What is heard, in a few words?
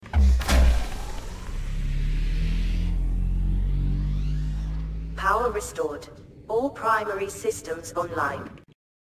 Power restoration